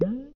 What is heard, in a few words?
Slime Vox Big